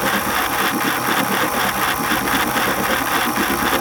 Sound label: Purification Machine Propeller Loop; Warframe; Nineteeen Ninety Nine; Infested Survival